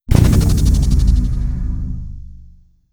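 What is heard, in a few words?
shield hit layer; apocalypse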